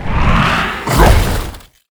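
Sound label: ice guardian attack, monster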